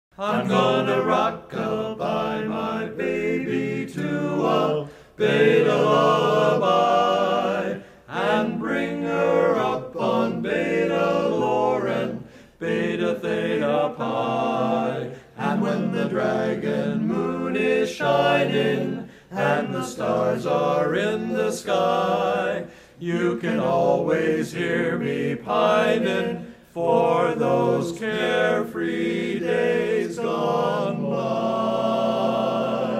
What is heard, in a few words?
Lullaby